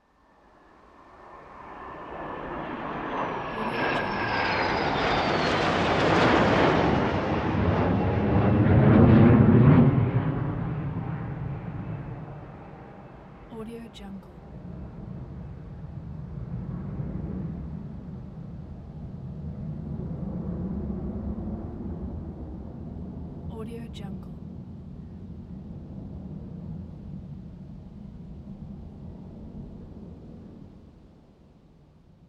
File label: three military jet planes flying by